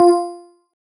marimba; ゆめ2っき

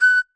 marimba

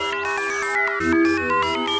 noisy; ゆめ2っき

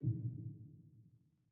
walk; ゆめ2っき

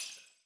sound glass

